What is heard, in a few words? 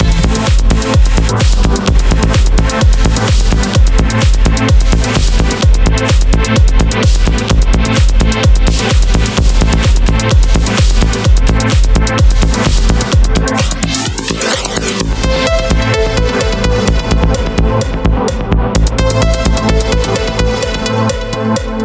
trance